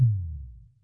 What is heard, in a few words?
ECHO; 300 Drum Machines; Yamaha Tenori-On; Echokit